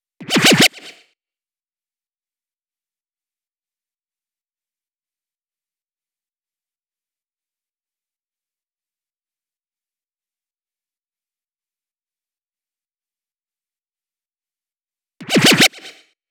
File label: 128 BPM FX Lazer Fill; Vengeance - Essential Clubsounds Vol.5; Demo Constr Kits; Sidewalk Kit Root G